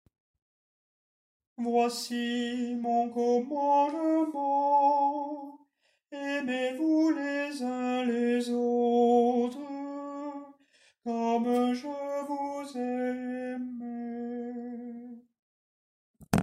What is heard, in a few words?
Tenor